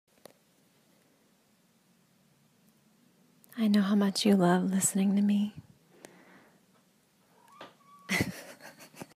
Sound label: Cat Blooper; Outake